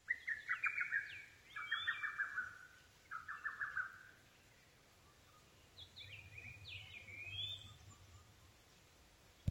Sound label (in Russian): malaya kukushka cuculus poliocephalus, zvuki kukushki